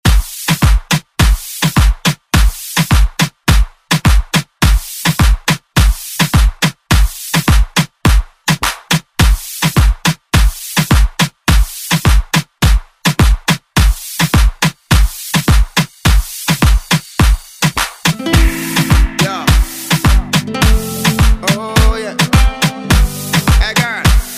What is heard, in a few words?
Moombahton; Redrum